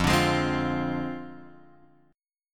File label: F Minor 6th F down Guitar Drop D